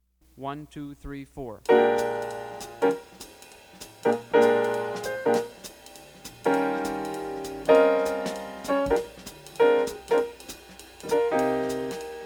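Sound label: fast blues in f no bass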